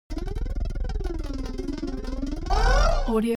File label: science fiction space detecting signal